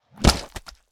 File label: GORE Splat Blunt Hit Bounce; mono; GORE & SPLATS; Universal Sound FX